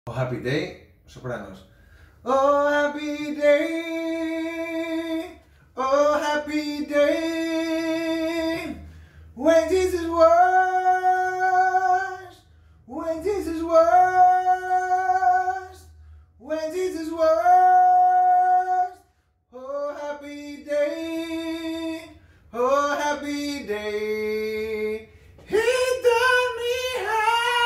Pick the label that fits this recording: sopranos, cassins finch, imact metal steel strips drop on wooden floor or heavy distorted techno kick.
sopranos